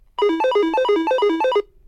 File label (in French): Telephone GIGASET DA710 Sonnerie